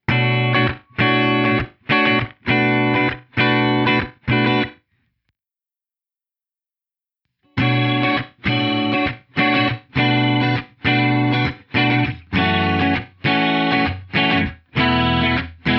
spinning multi

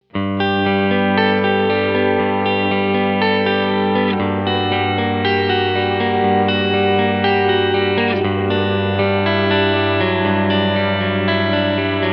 hall, big sky; HALL REVERB; I Believe

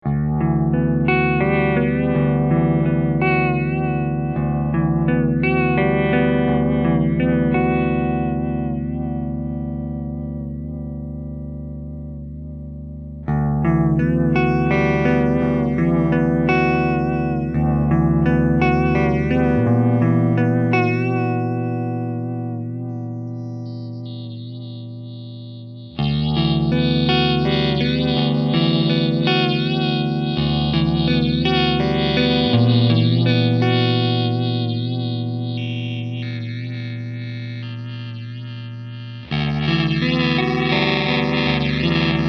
destroyer; Mobius

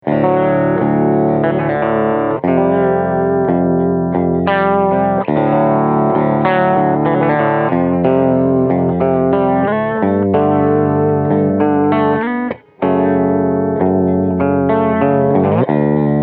vibe, mobius

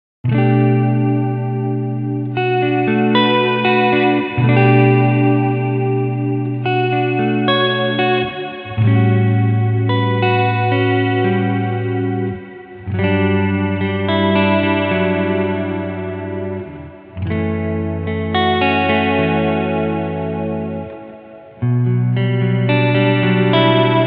plate Mod; BlueSky

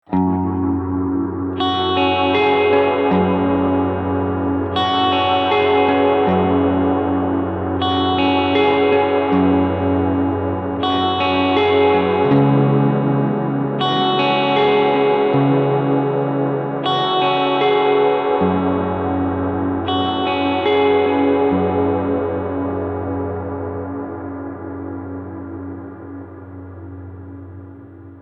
ice interval m3

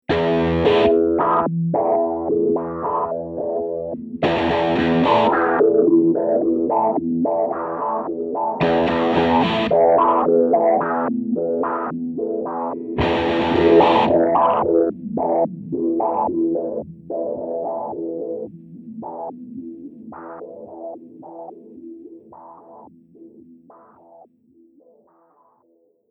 filter

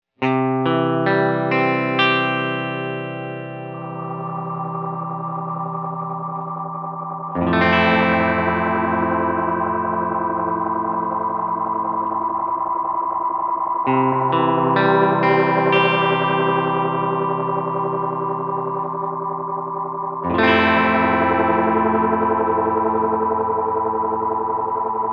Feedback Fast Mod

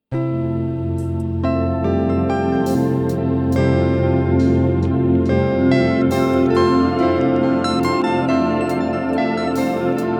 Real time Guitar; Magneto and StarLab

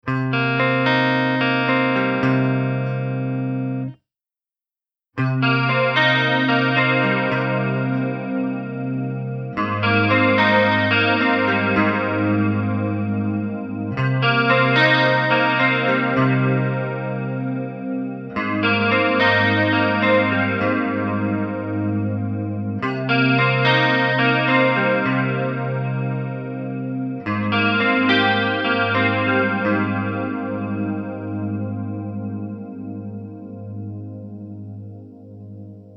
chorus; Mobius

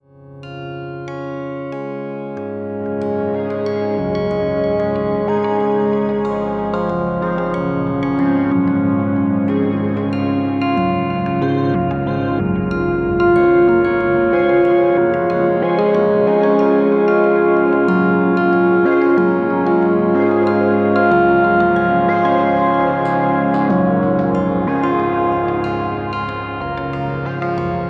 Random Root Fifth Size Pitch Mod; StarLab

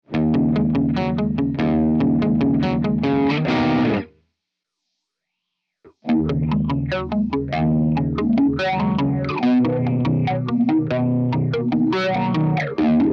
Relevant Resonance; The Strong Resonance adds movement and shifting harmonics to send to the overdriven amp.; Way Back Machine.

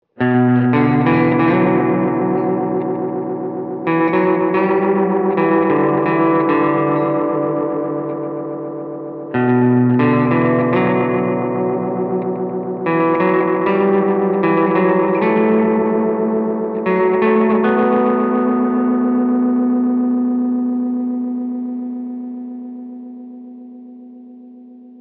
Feedback No Mod

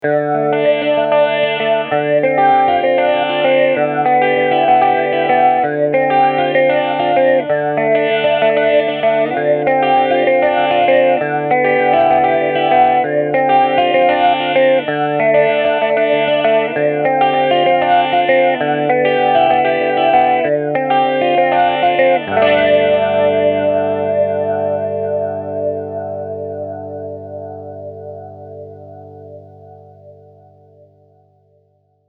formant, mobius

